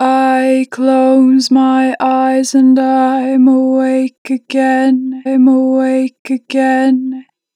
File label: Natural Vibrato, Vocals, 4 bar vib; Awake again; Harmor Vocals